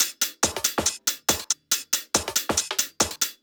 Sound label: Loops 140 BPM Hihat Percussion Loops